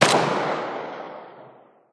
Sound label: bizon distant; weapons; Counter Strike Model Pack; CS2